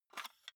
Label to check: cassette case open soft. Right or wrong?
Right